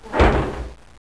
MAILBOX

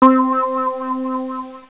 SPRING

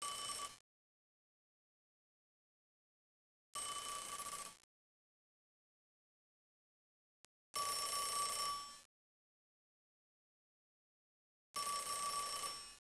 phone

